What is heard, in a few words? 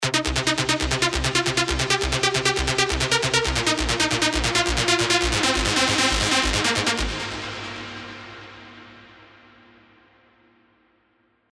Rave Signal, plucks; revealed spire ultimate plucks vol 1